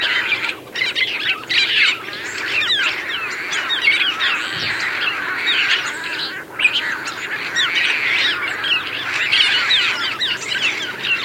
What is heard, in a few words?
Tricolored Blackbird Agelaius tricolor